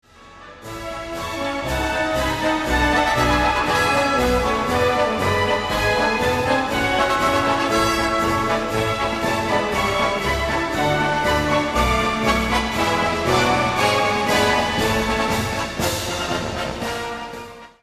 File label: Instrumental, Orchestra; La sonnerie de Noël au Lycée Charles Péguy de Marseille; Disney